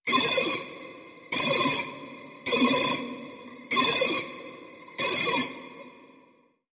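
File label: Alarm Spacey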